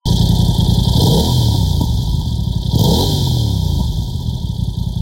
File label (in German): Sound ohne Schubakustik; BMW; K83 F900R